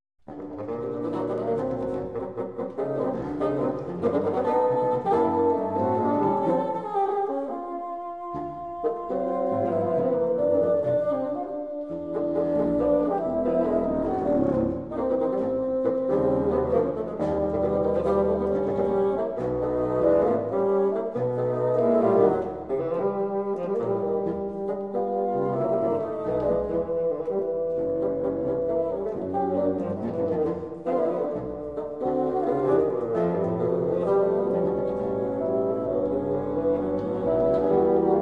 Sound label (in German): fagottquartett